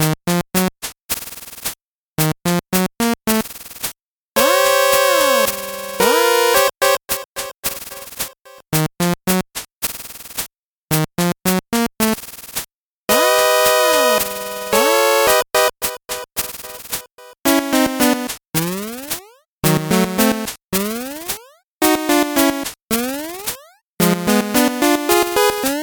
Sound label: 8bit bgm normal